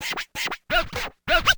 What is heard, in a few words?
scratch, DJ Booth